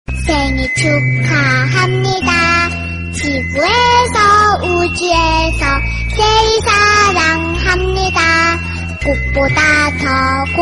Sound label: korean happy birthday